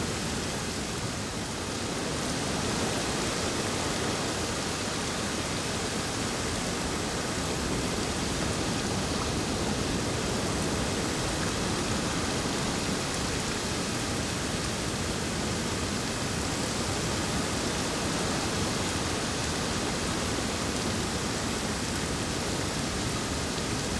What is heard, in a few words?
ambience beach day calm rainy; Rust